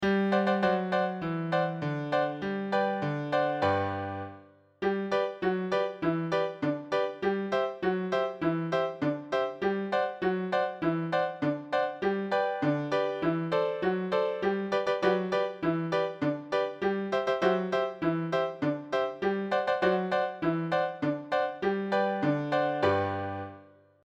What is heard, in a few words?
Pizzicato March